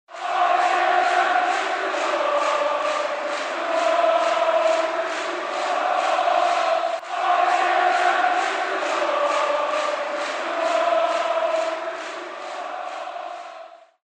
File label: crowd